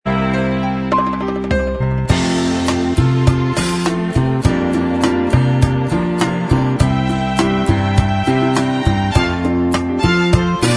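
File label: Inst Track, instrumental track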